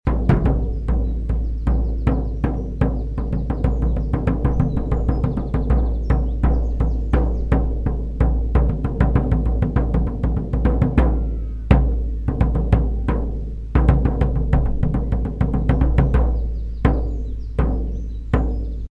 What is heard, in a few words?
Drums Civil War March; ultimate general gettysburg gamerip 2014